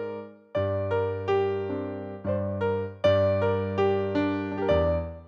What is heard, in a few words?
Piano